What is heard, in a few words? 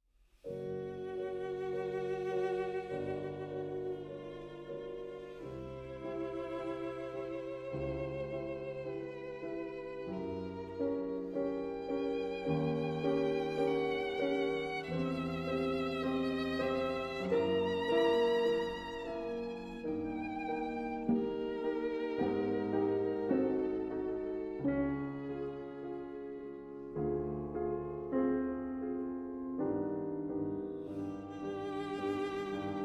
Shostakovich Piano Trio No I in C minor; Op 8